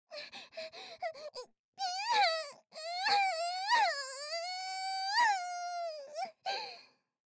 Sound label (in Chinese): Gaochao low; 搾精悪魔ちゃんの5日間寸止めゲーム～最後の日に失敗しちゃったら惨めな結末を迎えるよ～